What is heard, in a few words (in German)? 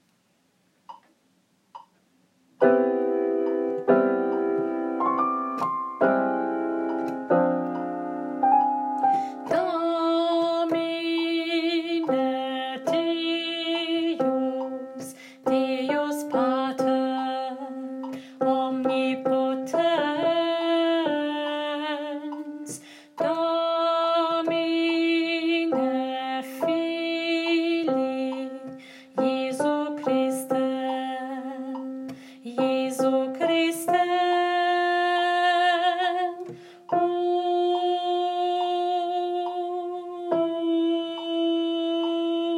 Gloria, Alt I; Alt I (Teil 2); Messe; Chor